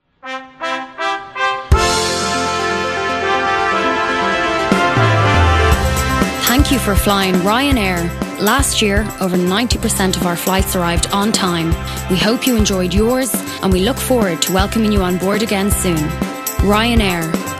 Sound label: Jingle; Ryanair